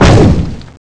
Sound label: whip sword stab skill flying